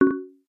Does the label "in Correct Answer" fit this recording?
Yes